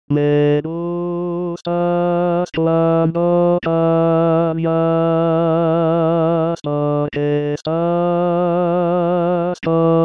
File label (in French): Tenor, Voix; Neruda